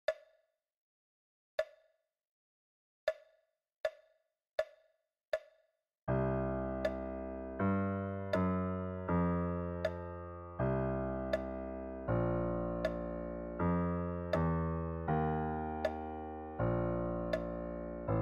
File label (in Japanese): ウォーキングベースライン Bass